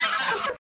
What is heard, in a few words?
Baboon Enter Fight; Lethal Company